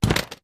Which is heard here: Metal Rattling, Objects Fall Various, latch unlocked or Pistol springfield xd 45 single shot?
Objects Fall Various